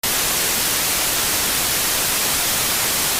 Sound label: TV STATIC